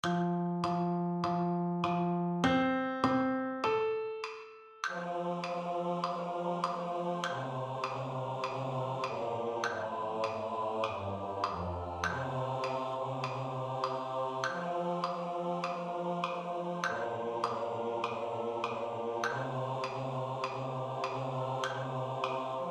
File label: BASS STUDY